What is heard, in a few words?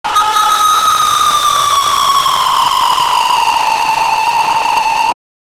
scream of fear with disto; horror